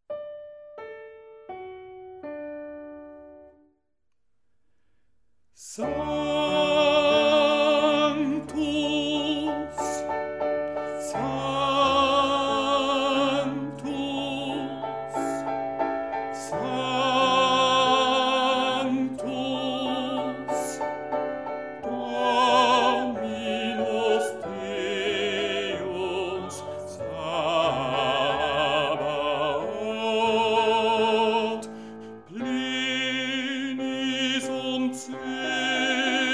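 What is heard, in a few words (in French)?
basse; sanctus; schola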